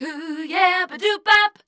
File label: MELODIC #D, Voice